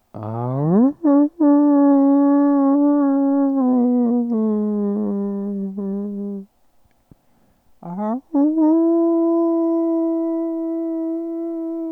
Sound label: howl